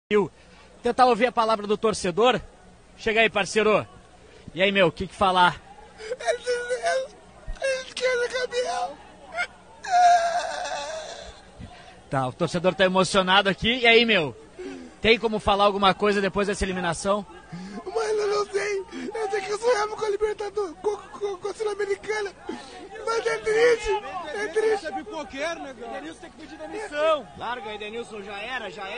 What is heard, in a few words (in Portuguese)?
torcedor inter chorando